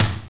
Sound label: Snare, Genesis; The Lion King; M8